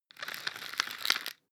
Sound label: floss pull out; haus-garten; badezimmer